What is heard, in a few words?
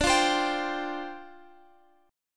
D Minor 11th D, Guitar, Open F; Dm11 strummed; x,x,14,12,15,14